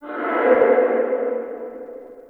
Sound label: Interior Distant Creature Groan, Infested, Ambience; Misc Indoor